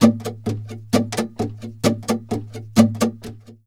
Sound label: flamenco guitar, duende fx